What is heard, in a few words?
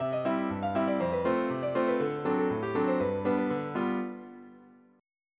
RAGTIME; General MIDI (type 1)